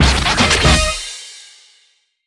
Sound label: Mission Notification Complete; subway surfers city sound effects; Android, iOS